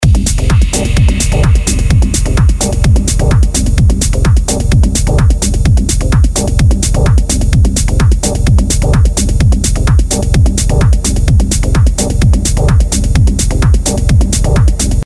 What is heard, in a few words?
Full Loop